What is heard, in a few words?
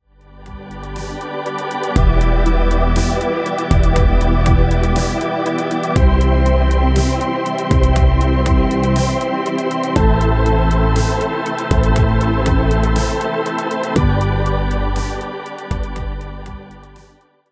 dystopian